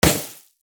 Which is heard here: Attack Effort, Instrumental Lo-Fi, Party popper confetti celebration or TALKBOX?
Party popper confetti celebration